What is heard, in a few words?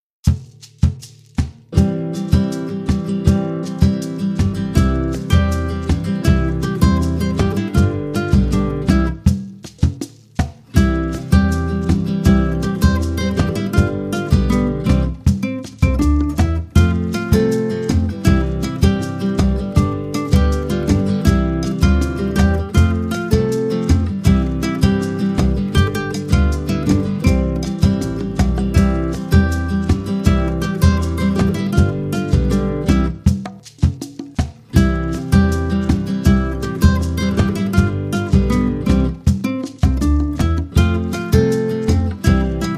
Calypso Dance